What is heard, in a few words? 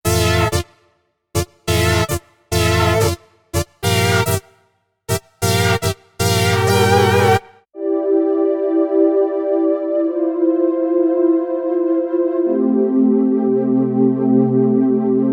Pad Poly Roland Lucina AX-09